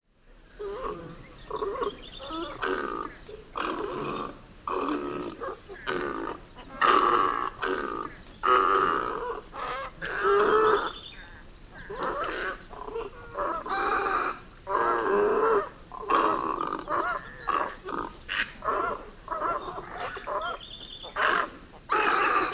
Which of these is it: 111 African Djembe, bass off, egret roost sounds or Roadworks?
egret roost sounds